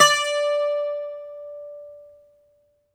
GR. BAZOU, Plucked Strings, Greek Bazouki; E-MU Producer Series Vol. 7 – Old World Instruments

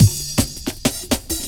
Starter 162, breaks

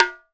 WOOD TAMB, Percussion; Voice Crystal - Steve Reid Definitive Percussion; BRAZIL SET

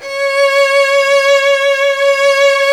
Virtuoso Strings; ILIO; Kirk Hunter Virtuoso Strings VOL-3; 90 s Sample CDs; CHMB STR ESP

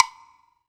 WOOD BLOC, HR-16 DRUMS; Drum Mashines; EdgeSounds

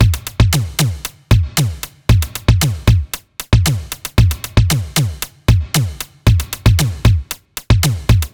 Beat Megatron; Zero-G; Future Wave; Beats

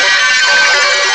DIN; M8; CMI Factory

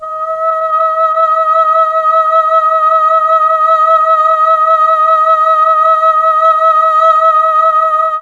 D#3 FEMALE, Mellotron; CHMB FEMALE; Mike Pinder - Mellotron Archive CD

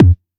TR909 kick, Roland SH-32; Drumset 04

